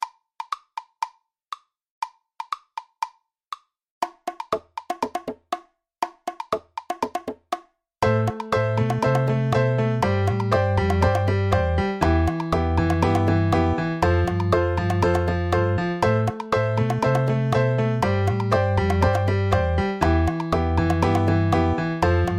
rhythm and harmony

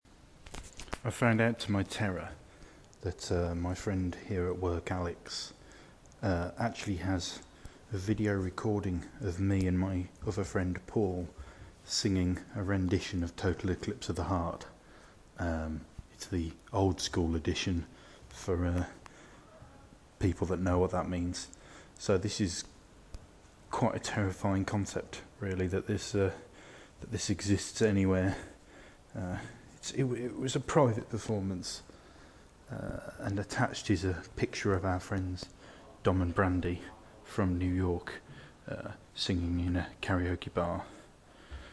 karaoke

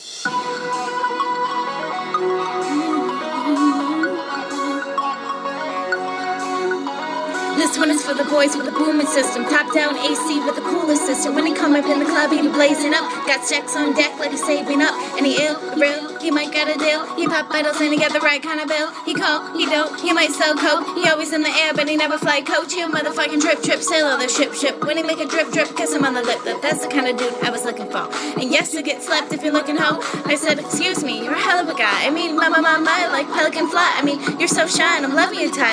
rapping

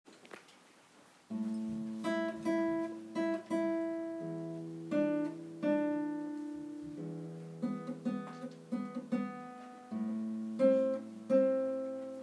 guitar solo

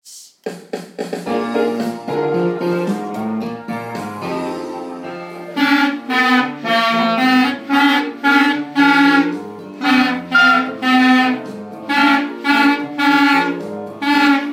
clarinet

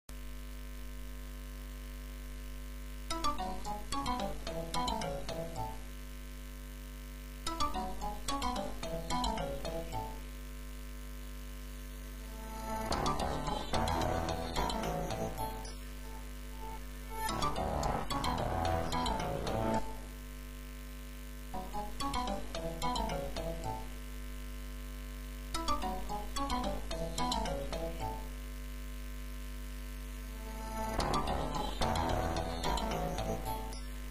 ringtone; nokia

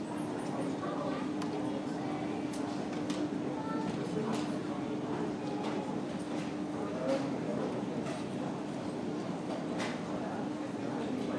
background noise; Hugendubel

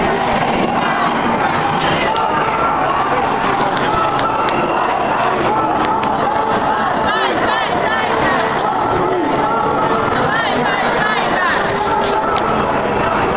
protest on signalling row

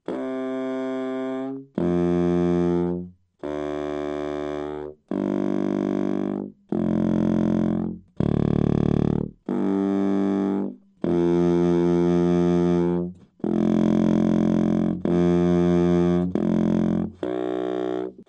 easy contrabass saxophone

